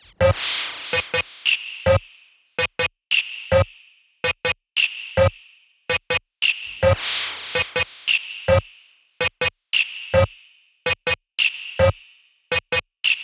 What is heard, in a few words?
Loop, PsyTrance